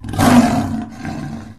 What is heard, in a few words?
lion grunt; minecraft; technic